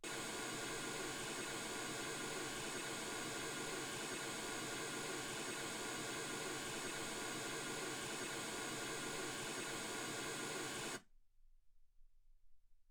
mls16 48kHz

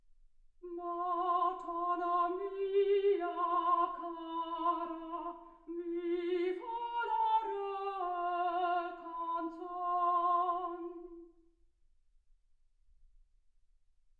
soprano

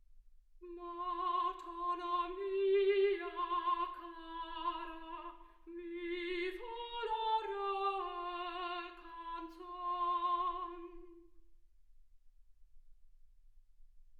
soprano

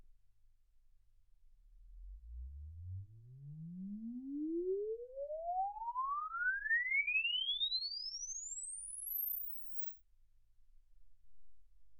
exp swept sinus 10Hz 24kHz

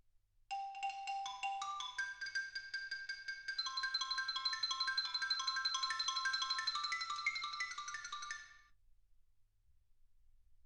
xylophone

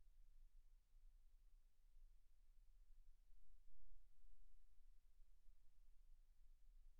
silence 48kHz

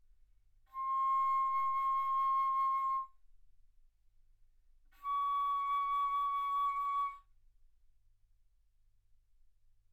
Bass Flute ff C6 Db6

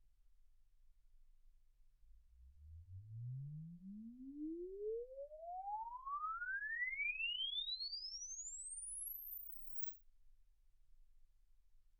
exp swept sinus 10Hz 24kHz

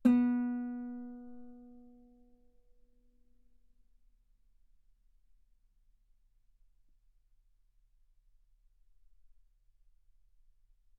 Guitar ff B3; sul B

